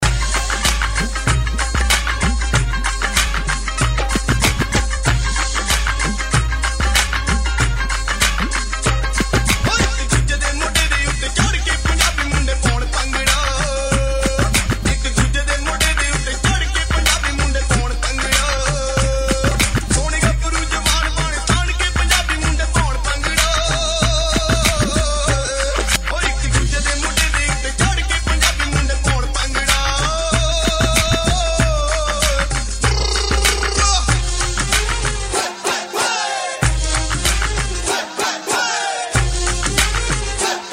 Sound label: Punjabi Mundey, Street Mixes